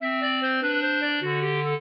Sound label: clarinet; Mozart